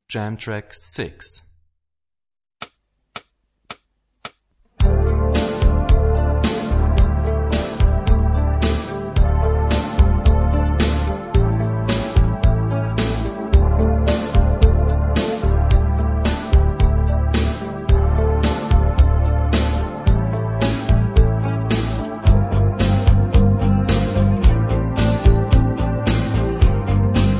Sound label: Rock guitar; Rock gt Secrets